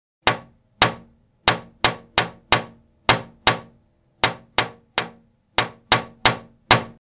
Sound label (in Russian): stuk v okno zvuk